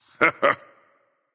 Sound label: "heh heh"; smite; voicepack; poseidon